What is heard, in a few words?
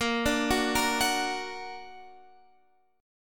Bb Augmented Bb Guitar Half Step Down, chord